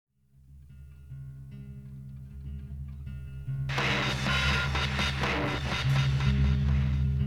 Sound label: fill